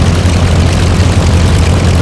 air; Mafie 1

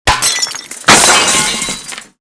lamp; Mafie 1

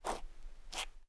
krok soup; Mafie 1

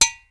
metal; Mafie 1

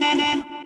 horn; Mafie 1

